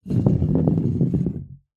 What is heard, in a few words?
bottle large glass empty roll wooden board; foley